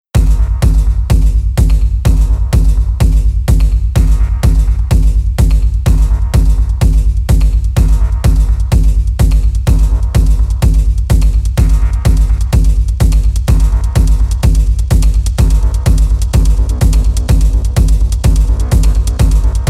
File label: Moog Conspiracy Thunder